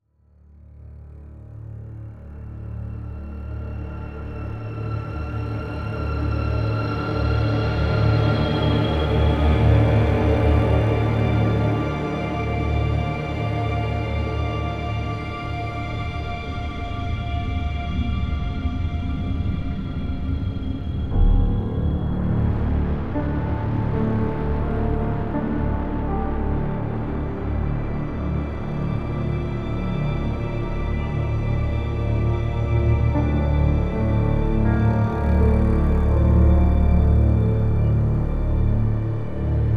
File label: Death Song; P.A.M.E.L.A.